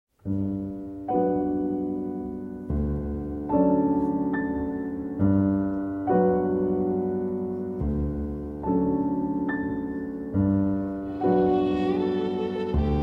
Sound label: Violin & Piano; Satie Variation; after Gymnopédie No. 1